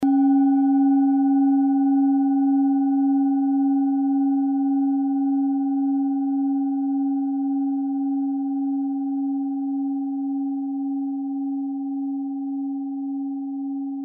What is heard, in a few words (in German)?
klangschale set; Klangschalen-Chakraset N°7 für alle Chakras; Gewicht = 610g; Durchmesser = 15,6cm; Höhe = 7,5cm